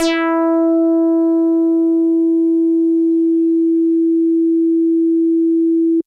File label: Synthe Bass DX100 E5